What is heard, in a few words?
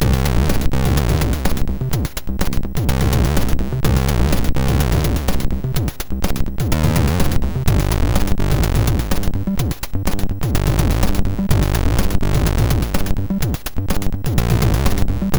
Loader Music; MOS6581R2